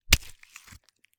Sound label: gore - medium metal blade wet impact in to flesh and removed; Gorification